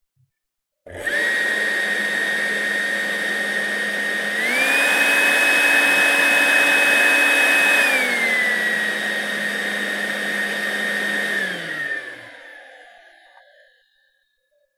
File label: Makita CL142FDZW